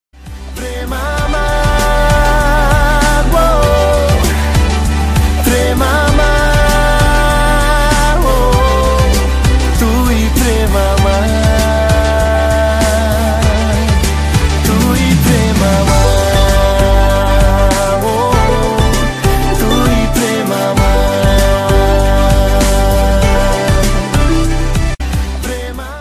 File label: bengali ringtone